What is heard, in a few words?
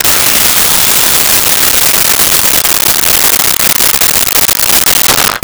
Circular Saw; Tools